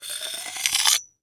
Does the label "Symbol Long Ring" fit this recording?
No